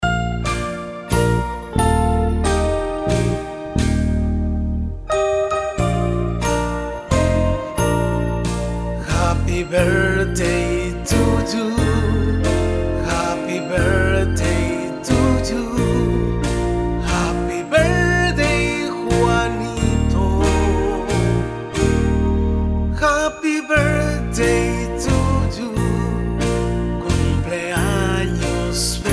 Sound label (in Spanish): Happy Birthday infantil; Happy Birthday infantil con nombre